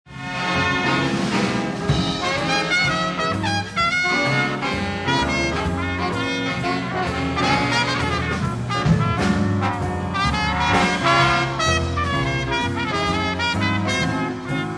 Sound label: ito lullabye